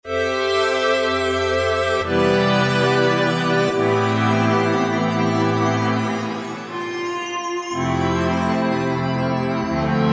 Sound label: Stero Away, Korg MSC-1S; Korg MSC-1S Variety